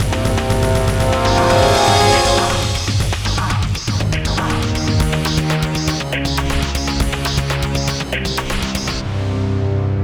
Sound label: first fight music; Ben 10 Protector of Earth; Wii